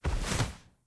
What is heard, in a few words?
Fall; blue archive